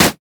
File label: red mech melee basic attack; minions; LoL